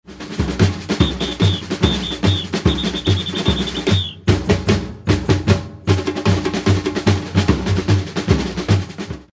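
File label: Whistle Quick, batucada; שריקה מהירה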